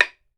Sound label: BAMBOO, World Assortment